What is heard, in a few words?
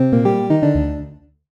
loss